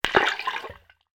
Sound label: nature water splash small underwater object throw in; zapsplat